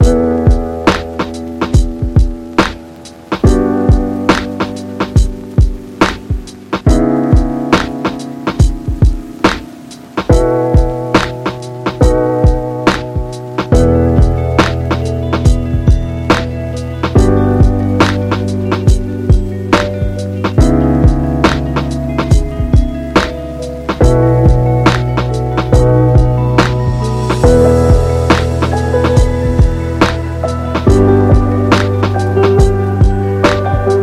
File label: Low Fi; lounge